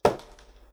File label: Cardboard Box Wood Hard